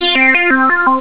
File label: Analog, Cisco Unified IP Phone 7945G